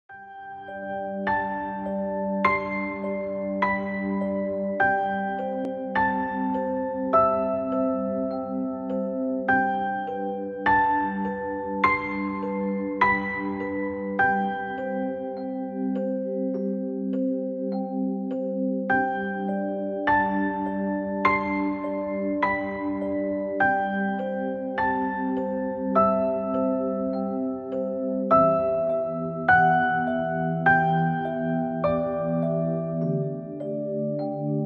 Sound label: Piano Relaxation